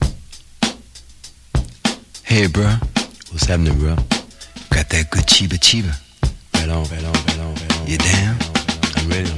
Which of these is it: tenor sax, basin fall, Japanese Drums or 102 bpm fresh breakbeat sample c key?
102 bpm fresh breakbeat sample c key